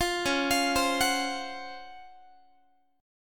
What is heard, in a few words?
Db Major 11th, Guitar; arpeggiated